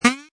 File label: Cartoon Dud, Short, silly, funny, comedy horn bonk.; Comedy/Cartoon DUD; Funny Sounds